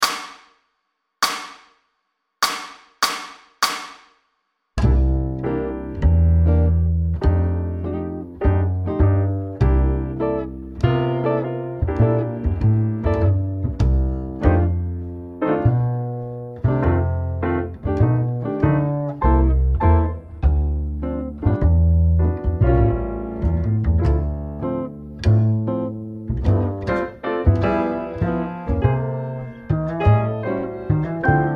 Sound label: blues drums, jazz; LMEA All-State Jazz